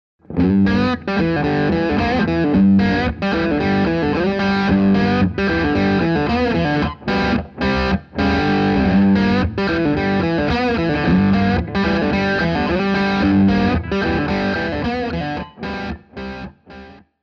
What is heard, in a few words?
lick